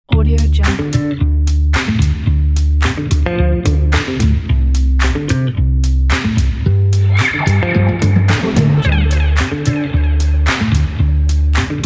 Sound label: energetic upbeat fun